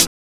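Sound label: hi hat; E-Lab; X Static Goldmine 1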